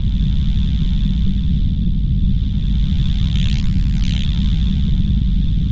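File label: disrupter loop, electronic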